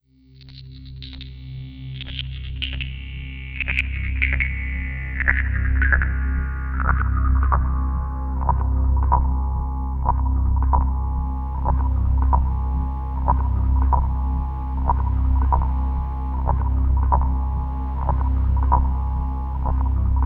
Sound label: Click Backdrop; Sonic Foundry (Sony Creative Software); Slow Silhouette